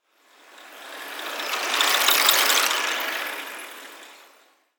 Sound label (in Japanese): 自転車通行音